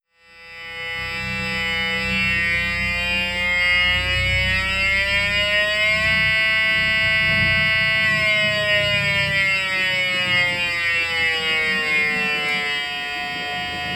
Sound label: op amp osc; oscillators